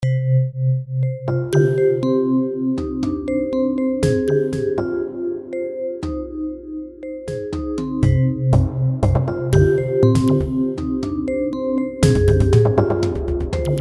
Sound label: string; Katarakt records